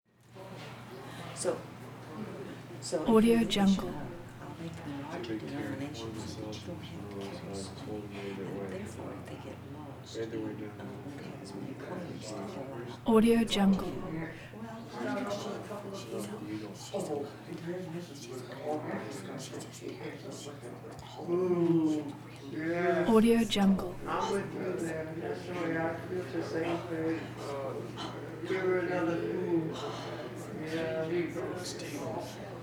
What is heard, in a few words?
bank walla constant; prosoundfx